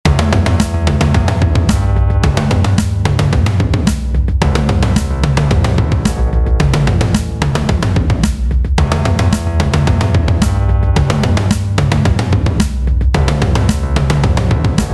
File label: Synth